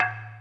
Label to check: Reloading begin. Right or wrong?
Wrong